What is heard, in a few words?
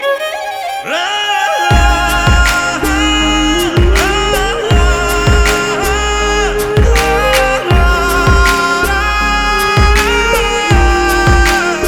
Humming Ringtone